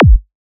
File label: Kick